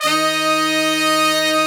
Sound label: BRS, R&R Horns